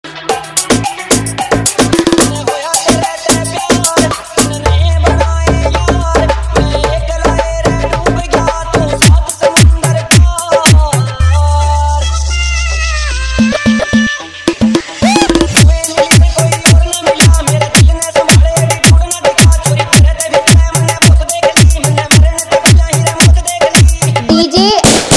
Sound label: Remix Haryanvi Dj Song